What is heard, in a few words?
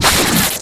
Web Launch Shot, Weapons